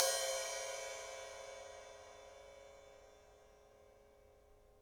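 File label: ride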